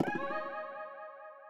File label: save